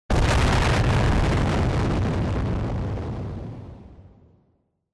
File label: Explosion, Close